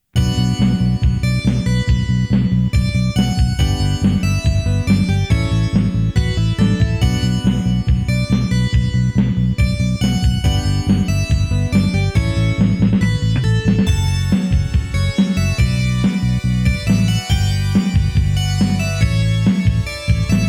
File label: All Clear; Raiden II